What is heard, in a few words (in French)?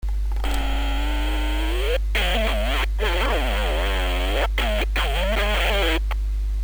reveil